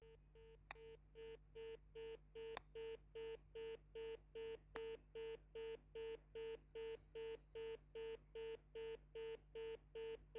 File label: tone